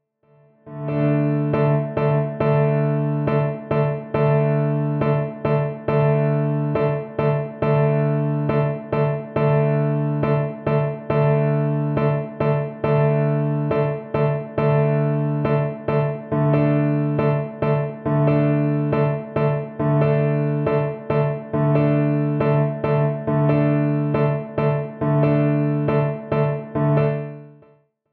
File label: Rondino